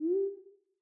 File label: woop